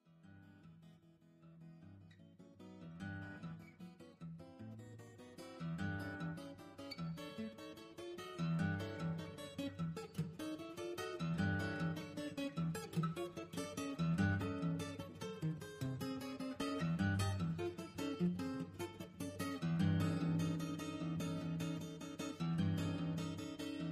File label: Piano Sonata no 7, transcribed for guitar; Sergei Prokofiev; Movement no 3